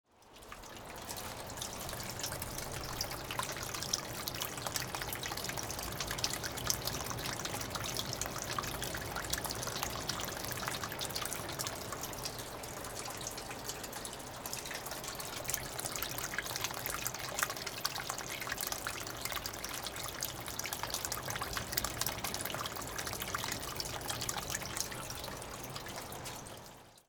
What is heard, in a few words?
Dark water; AUSTRALIA; Les Cartes Postales Sonores; NOISE ON EARTH Vol7